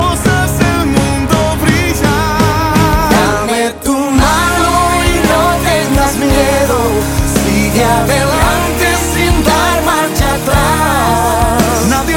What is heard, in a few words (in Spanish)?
a una voz coro